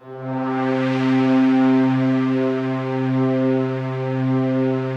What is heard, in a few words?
big slow pad C3, Yamaha VP-1; Music Store; 90's Sample CDs